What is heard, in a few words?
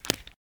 Break Bone Light; Osteotic Bypass